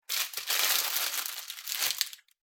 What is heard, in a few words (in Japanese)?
まな板に食材を置く音